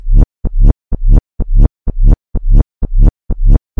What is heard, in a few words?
bass pulse, synth and electronic loops